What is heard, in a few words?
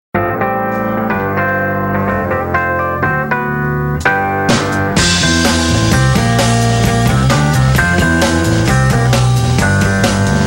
Country And Eastern Music live